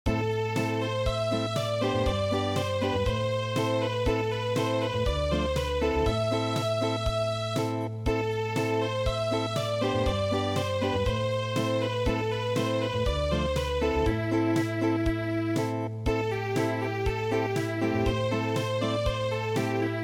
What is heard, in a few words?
March Acoustic; midi; traditional